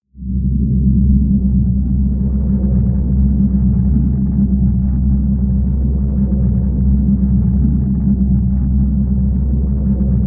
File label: drone tracer